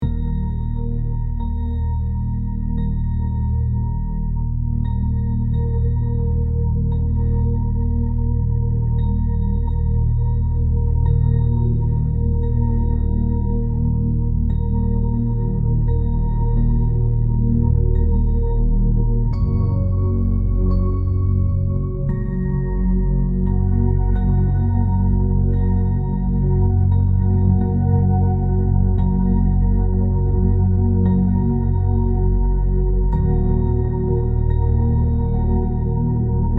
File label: 639 hz frequency; connecting frequency; frequenze